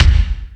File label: Lotsa Kicks, Drum Machines E-MU Pro-Cussion